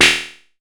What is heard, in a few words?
SNARE, Electro Perc; E-MU Formula 4000 Series Vol. 3; Analog Odyssey